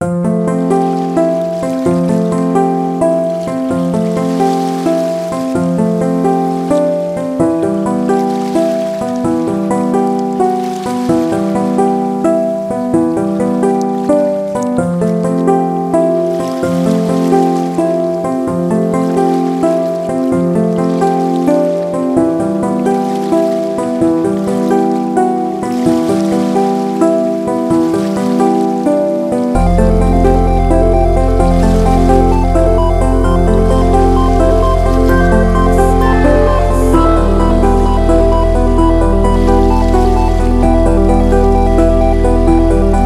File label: water music underground; rpg fortress; grandpabard